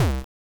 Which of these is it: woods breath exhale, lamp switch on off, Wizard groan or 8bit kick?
8bit kick